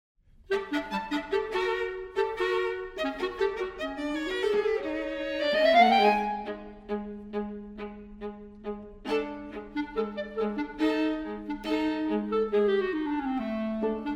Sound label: Allegro for Clarinet & Viola, Allegro vigoroso